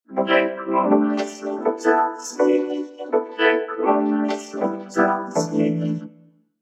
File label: Vocodex Test